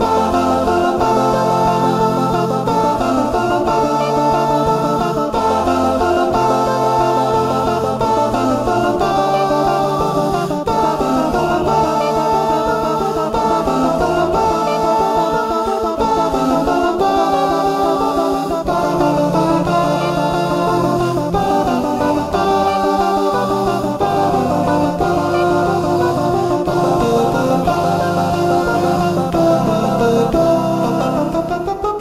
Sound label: smooth groove loop; w00tguy; arcade